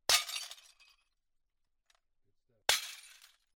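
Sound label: breaking with a stick fragments of different sizes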